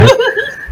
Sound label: instant teleport; pattun 3 cha